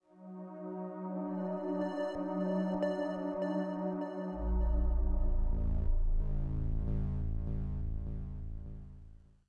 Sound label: Synth Pad